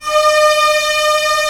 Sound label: STR A2 FF R, STRNGS, Orchestra; Sound On Sound - Peter Siedleczek's Orchestra